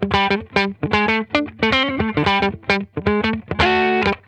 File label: FURRY, Funk Guitar; Zero G - Funk Guitar